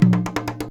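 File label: junjunba groove; Best Service; World Colours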